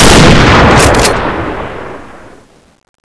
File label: shoot; ghost knight; cstrike